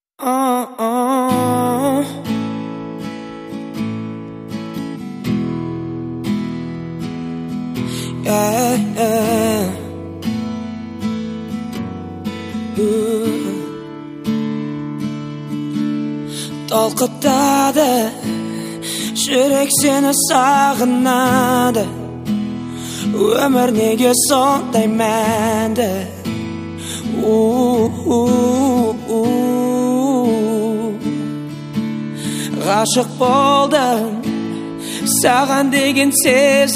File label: ambient noise